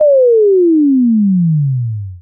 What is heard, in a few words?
fall; rescue